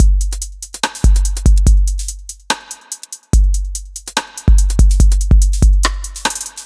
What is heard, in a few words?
144 drum loops; FutureBoy; M8; Electronisounds